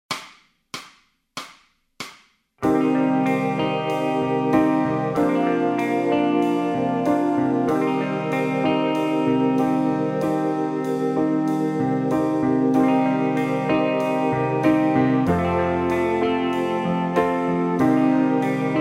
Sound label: bass scales, backing tracks